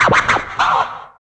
FX Dance

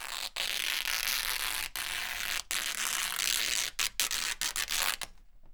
rip, Canvas Bag Rips

